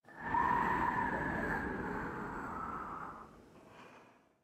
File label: cold wind gust; Factorio